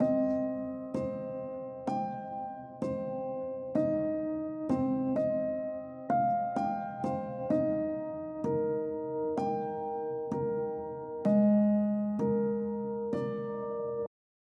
REVERB RAUM OFF PIANO ONLY

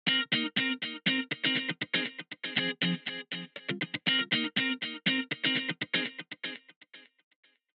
DELAY 14

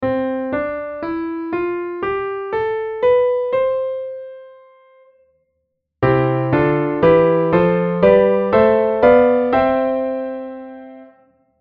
C major diatonic

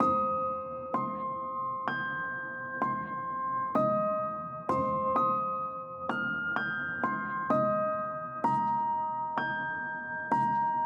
REVERB PIANO OFF; raum

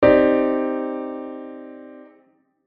Cmadd9

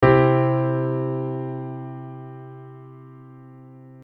C major

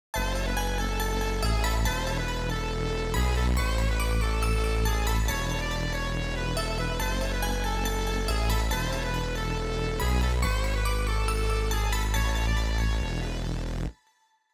BASS CORD CHANGE

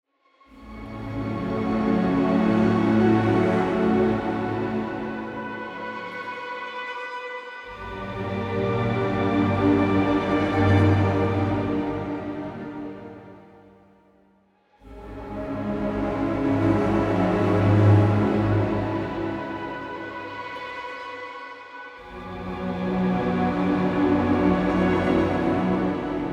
KONTAKT THIRD PARTY SONG TUNDRA ONLY

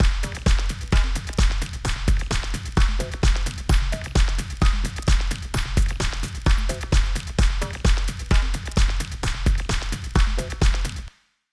ELECTRO ROCK DELAY OFF

